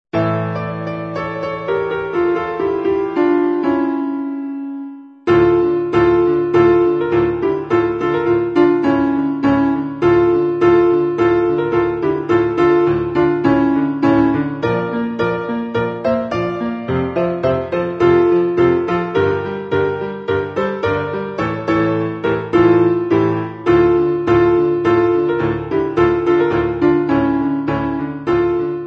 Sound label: balkan beats